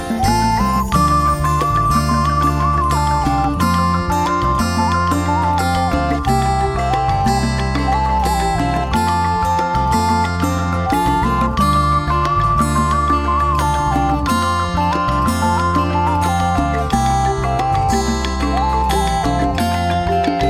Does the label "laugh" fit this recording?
No